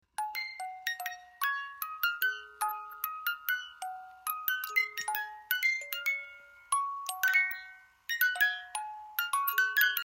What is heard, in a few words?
Music Box Pull Cord Brahms; mixed pack music boxes